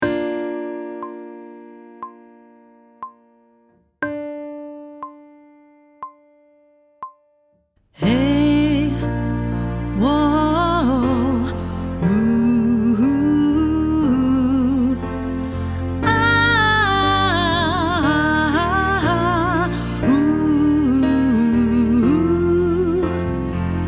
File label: Sight Singing with vocal, pop vocals; Grade 5